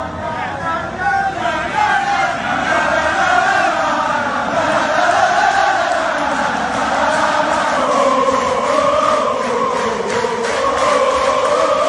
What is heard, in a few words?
best pisa chants, fan chants